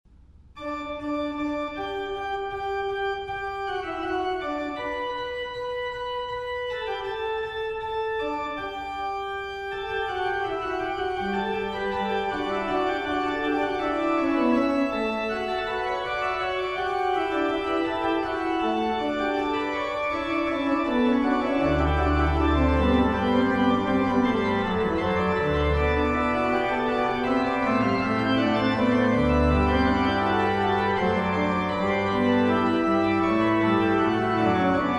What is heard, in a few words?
Bach Fugue In G Major, organs